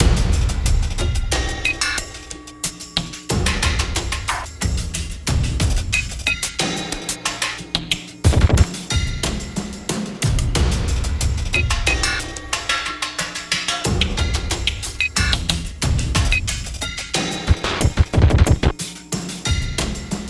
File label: Mountain Small Fight; sky break